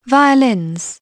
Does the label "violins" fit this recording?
Yes